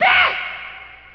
dayhuh; ooh ahh

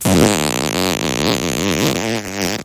fart long; Farts

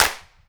coughing; Snares

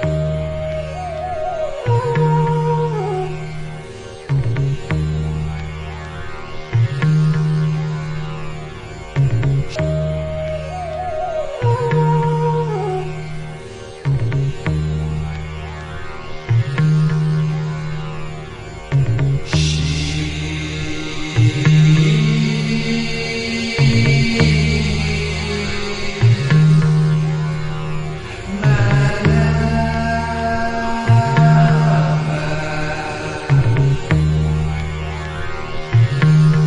flute tribal flutes, Loops, Jazzmatazz

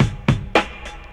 boom bap, Drum loops

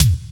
glassbottom boat, Kicks; Medium

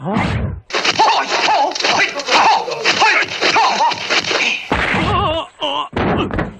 martial arts

